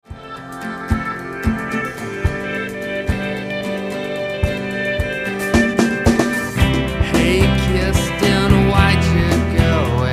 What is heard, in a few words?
drum